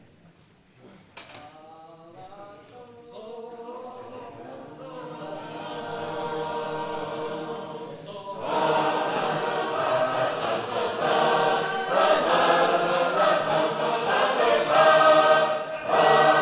Schenectady, sacred harp

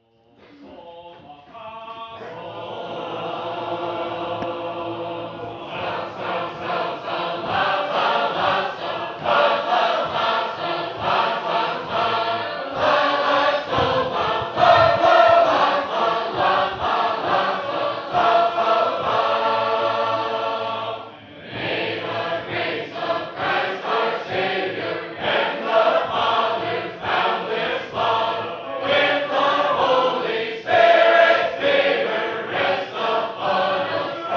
Columbiana, sacred harp

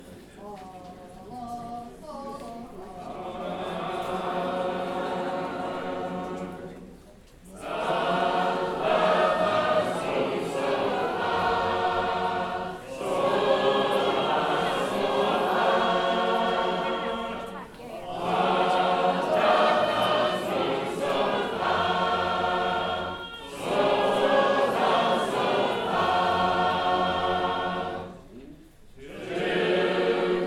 Sacred Harp; Warning

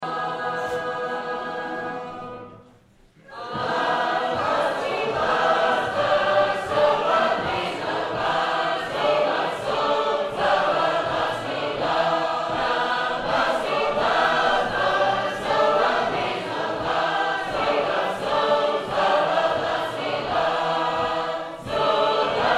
Fulfillment, sacred harp

